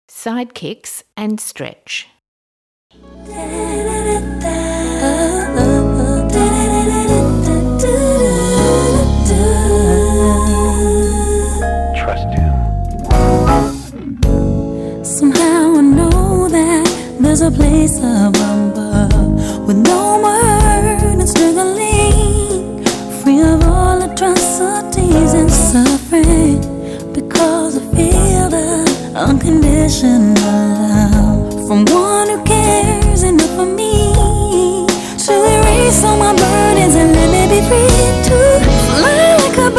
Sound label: Side Kicks Stretch; Silver Star